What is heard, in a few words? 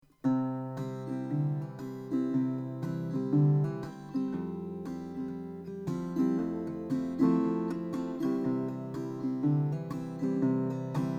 nashville guitar mini parlor; terz